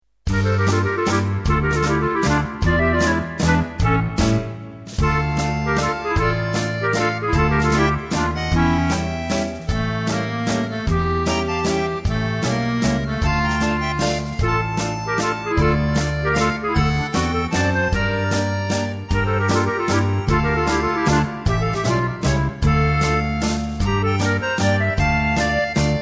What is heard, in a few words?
Mazurka